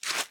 Footstep gravel

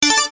Ding; gator panic

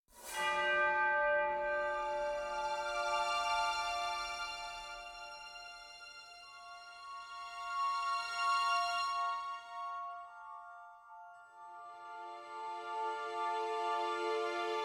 Cementary Explorer Alternative Loop; The Witcher 3 Wild Hunt; Toussaint

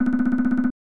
Unused Jingle; mario paint game rip

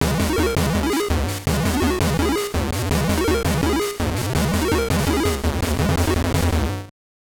Jingle; San Francisco Rush 2049; GBC

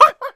Monkey boss Hit

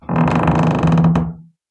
Door squeak creak

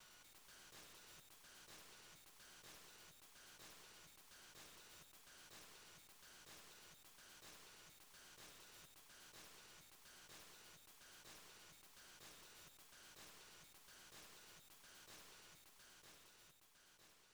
Pumping string; msx2 gamerip 2016; SootSound Demo

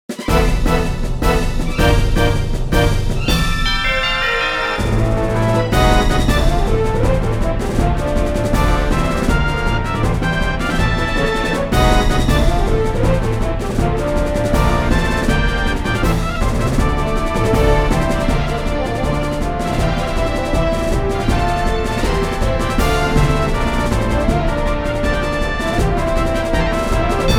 music quest battle

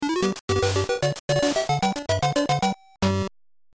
floor clear; Adventures of Lolo 2

